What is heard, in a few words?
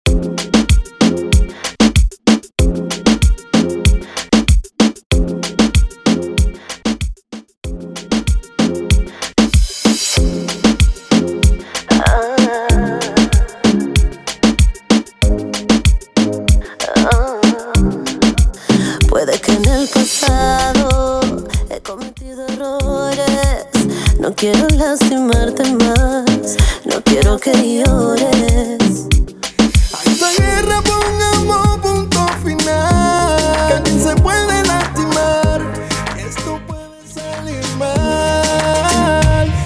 Intro Clean Outro 95 Bpm